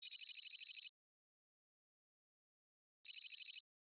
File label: ship cockpit screen